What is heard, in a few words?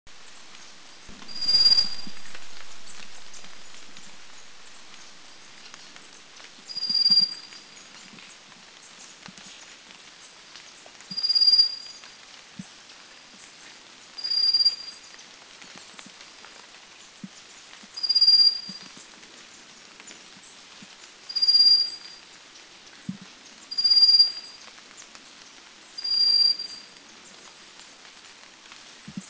Rufous-faced Warbler Anmashan; Abroscopus albogularis; Taiwan; Fairly common